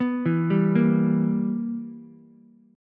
B Major 7th Suspended 4th Sharp 5th Ukulele, chord; BM7sus4#5; arpeggiated; 4,4,3,1